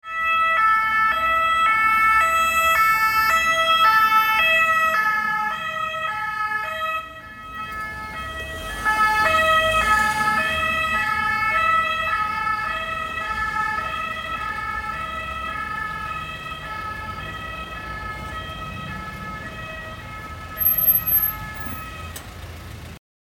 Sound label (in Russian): sirena polic, policejskoj sireny